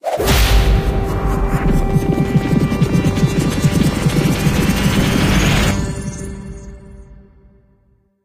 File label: roll Fast